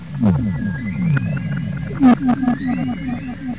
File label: whale